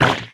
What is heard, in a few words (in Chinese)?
鱿鱼受伤声